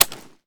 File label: g36c mag click; daffas arsenal; tacz